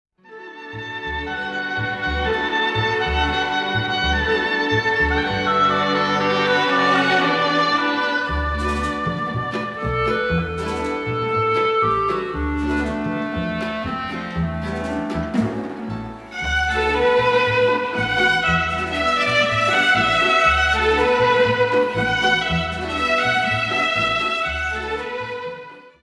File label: Orch